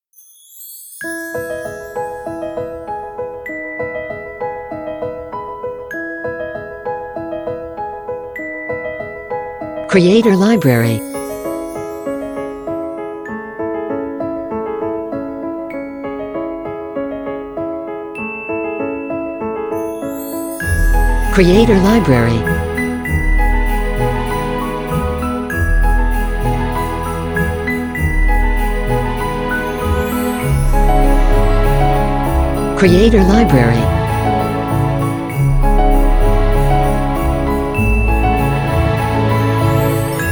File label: Christmas Piano